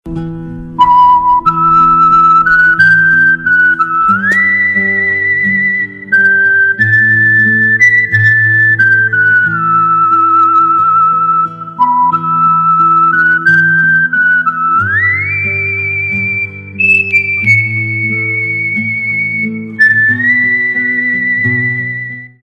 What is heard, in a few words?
country whistle, ringtone